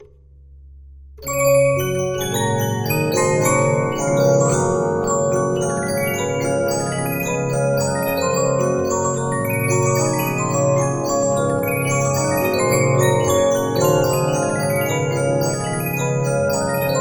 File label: Regina, 15inch disc musicbox